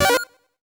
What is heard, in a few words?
UI Futuristic Sci Fi